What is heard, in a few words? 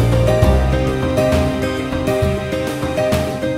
loop